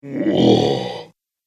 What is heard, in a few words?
Ogre Growl; Monster Sound Effects; horror sound effects